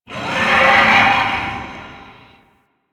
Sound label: Arcade Dino Laser Roar; Warframe; Ambience; Nineteen Ninety Nine